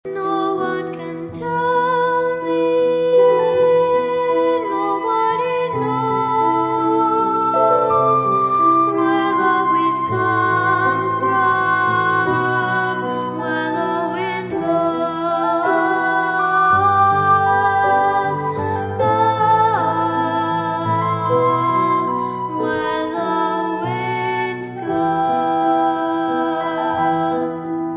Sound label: wind on the hill